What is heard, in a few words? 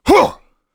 throw; UFC Tapout; XBOX